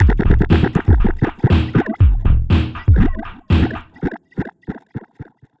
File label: Short Odd Intro